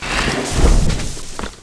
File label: death